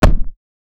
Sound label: singular ball kick sound, soccer